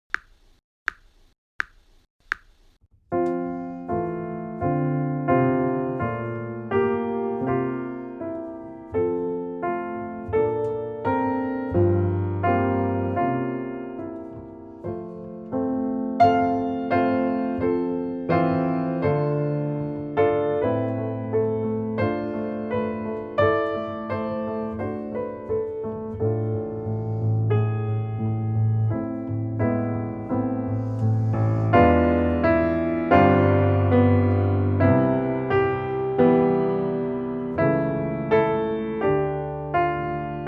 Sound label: Delightful journey Var. 1 Piano